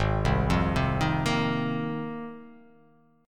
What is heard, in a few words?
G sharp 6th Add 9th G sharp